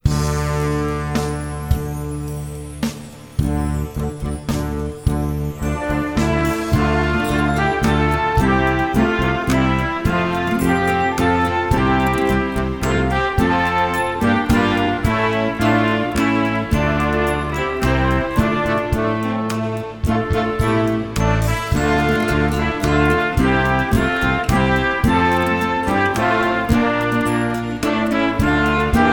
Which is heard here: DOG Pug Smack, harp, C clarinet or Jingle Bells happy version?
C clarinet